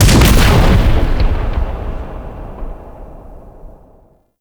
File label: Corpus Arachnoid Camper Quick Draw Fire; Warframe